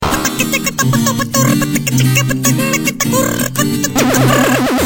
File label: Funny Sms Tone